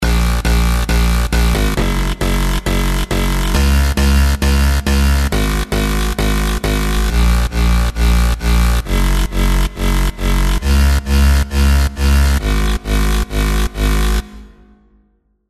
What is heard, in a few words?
COMP BOUNCE BASS